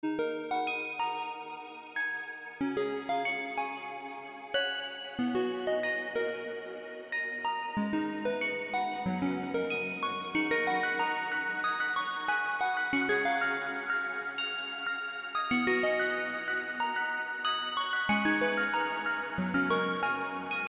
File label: 8bit adventure; looperman; PirateTreasureHunt